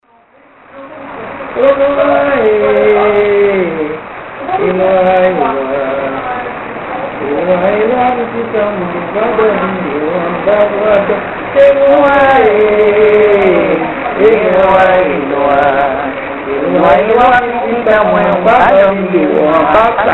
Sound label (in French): chant